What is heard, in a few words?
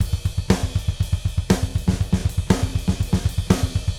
Gretsch groove 120 BPM, 1967 Gretsch Kit drums